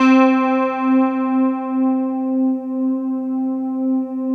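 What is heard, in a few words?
keyboard PAD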